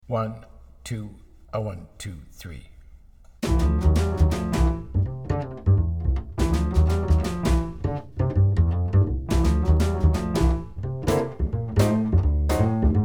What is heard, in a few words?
minus melody